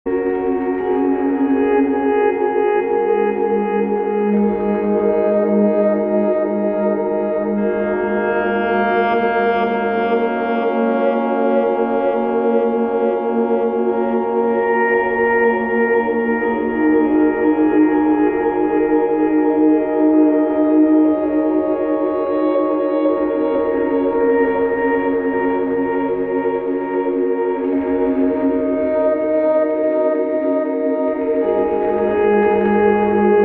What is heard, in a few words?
ambient; zero bedroom apartment